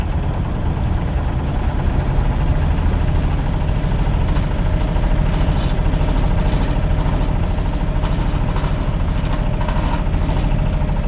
vehicle fishingboat drive; teardown; ps5 windows xbox series xs

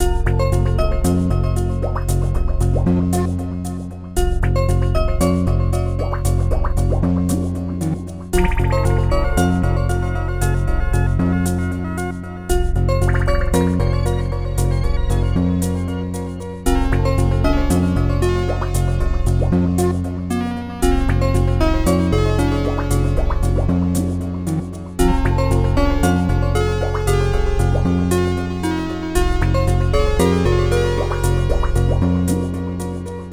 Cave Loop; Mission in Snowdriftland

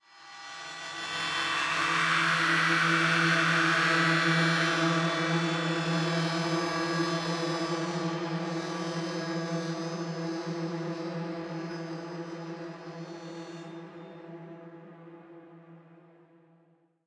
Stinger Intensity; The Park

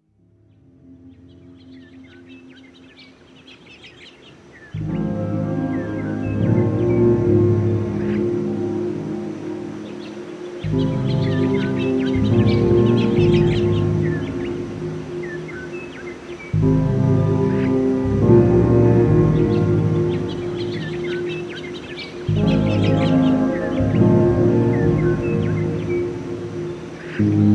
Ambiences; the stanley parable ultra deluxe

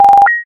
Coin combo; dash till puff 2; android ios

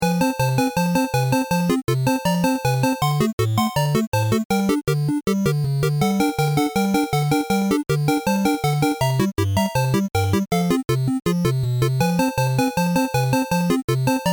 BGM Slot; Yorozuya Yuusha Shop Do Not Stop a Hero Brave; HappyHoppyHappy; Android

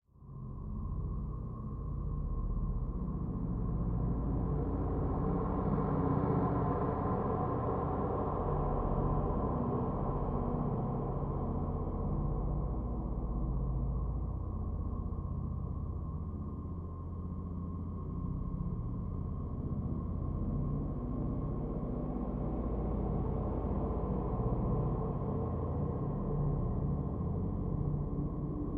Simple horror

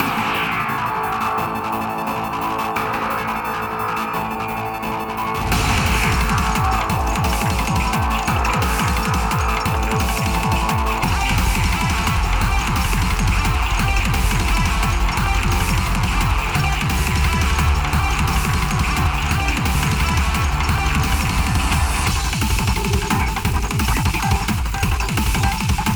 BGM BOSS; Giga Wrecker Alt.

